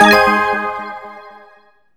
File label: RESULT TAISEN WINDOW; maimai